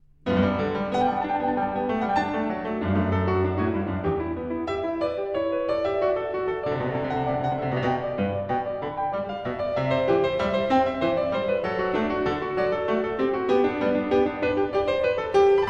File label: Bach Goldberg Variations BWV988 Variation 14 - Allegro Moderato